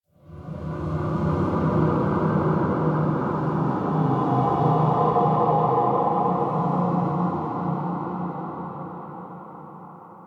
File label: Ambient Texture; Warframe; Ambience; The War Within; Golden Maw